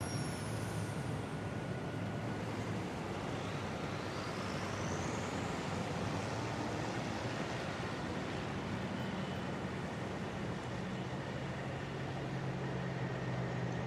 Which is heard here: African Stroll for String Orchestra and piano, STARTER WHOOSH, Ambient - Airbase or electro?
Ambient - Airbase